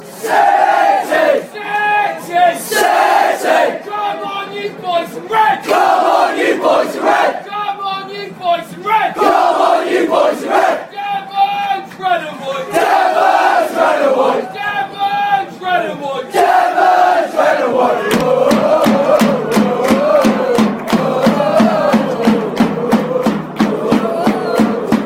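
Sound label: fanchants, football chants; devons red and white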